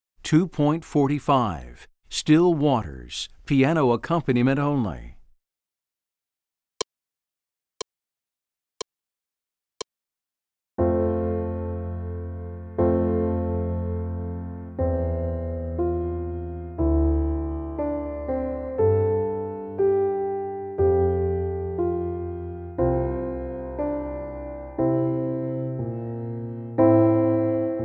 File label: Piano Accompaniment